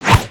Sword Slash; aronwk collection; ndaudio

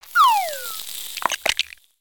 laser death; foley

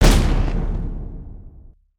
charge

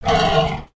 Pets Triceratops Beg; creatures; Lego

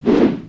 WHoosh; creatures organic

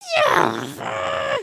disappointed; emotes

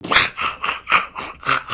Laugh; Skullkin; creatures organic

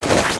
shorter BACKPACK cloth; aronwk collection; ndaudio

